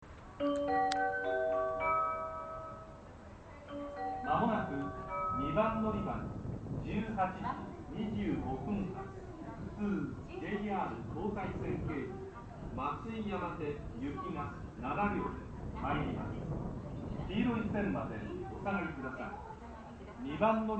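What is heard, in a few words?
sakurasyukugawa, departure bell; osaka; jr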